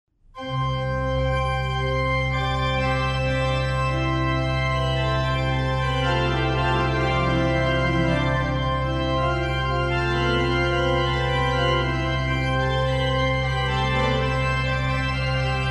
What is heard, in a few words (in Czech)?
varhany